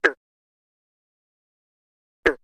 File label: Frog Various